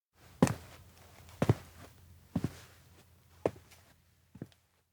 steps indoor stand wobble; meguriai; chiharu; anemoi パッケージ版 1.1

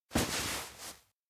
pillow turn; anemoi

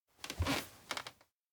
peek; anemoi

